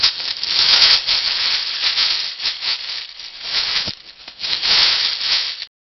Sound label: light rustling of leaves, giggles